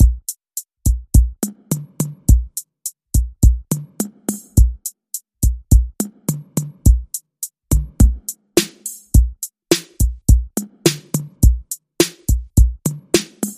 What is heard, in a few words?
Simple Drum Machine